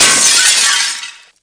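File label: medium glass, impact; SINDEMO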